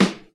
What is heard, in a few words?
smooth snare drum sample g key, steel snare drum sound tuned to the G note